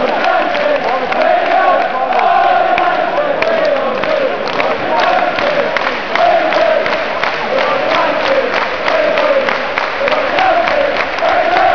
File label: we love united, Chants; Manchester united